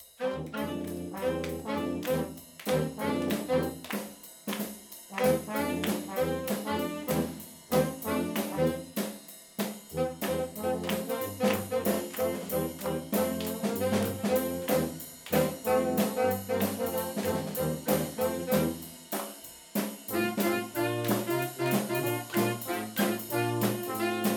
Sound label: swing